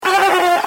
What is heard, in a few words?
beluga